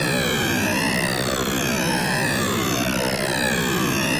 DISRUPTER; disrupters

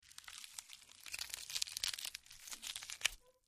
Breaking Tearing

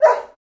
Shepherd Dog Bark Ind

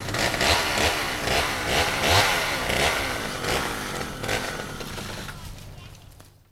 Chainsaw Rev Up Then Turn Off, Ambience; chainsaws

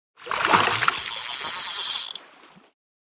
Fish Reel Strike, fishing

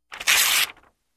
Paper Flex Rip

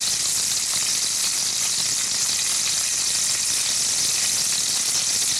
Deep Fat Frying, cooking & food

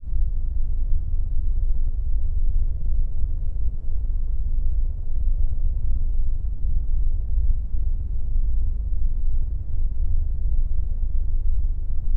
Space Ship Ambient; spaceship fx

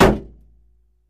Car Door Hit

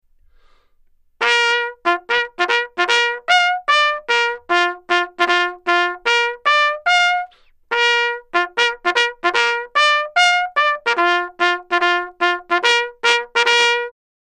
Bugle Plays Tattoo, bugle civil war

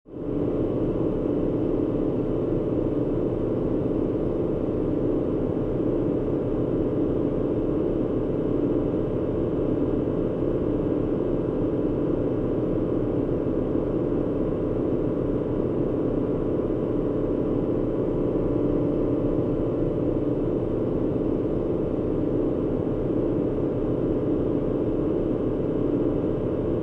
Heavy Fan Start Up Buz, industrial fan

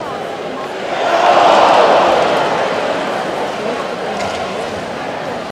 Crowd Awe Groan

